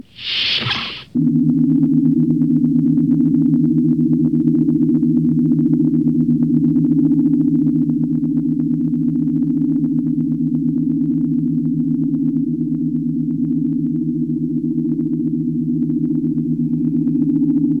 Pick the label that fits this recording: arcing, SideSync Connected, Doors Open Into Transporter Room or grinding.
Doors Open Into Transporter Room